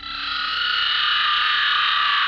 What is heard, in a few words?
inst wave; Rubber Chicken Software Sample Bank